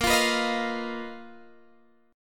B Major 7th Flat 5th Bb, chord shape

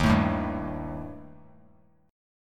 D sharp Major Flat 5th Bass Standard; strummed; D#Mb5; 11,12,7,0; JGuitar

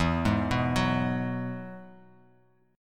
E Major Add 9th; arpeggiated; JGuitar

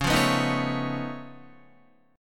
C sharp Augmented 9th C sharp Guitar; strummed; 4F,4A,5C,5G,5C,6E; C#+9; 8,8,11,8,9,9; JGuitar

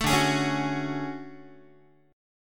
D Major Sharp 11th D Guitar, chord; DM#11 strummed

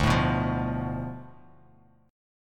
D Minor 6th Bass Standard; Dm6 strummed; JGuitar

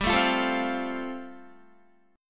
G 7th Suspended 2nd Suspended 4th, Guitar; G7sus2sus4 strummed

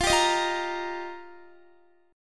F 7th Suspended 2nd Suspended 4th F Guitar; F7sus2sus4; x,20,17,20,17,17; 4E,4A,5D,5G,5C,6F; strummed

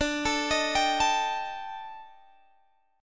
D sharp Major 7th Flat 5th, D, guitar chord

